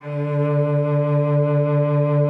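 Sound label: D2, cello vibrato; Xsample VOL-7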